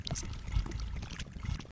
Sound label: Monster Idle